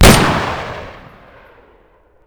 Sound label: mg-42 fire; WAR